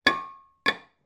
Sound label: household teapot china lid remove and put back on